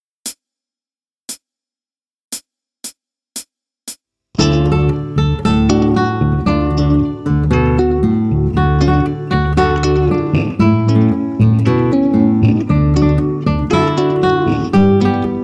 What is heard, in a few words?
blues trad usa; tom cat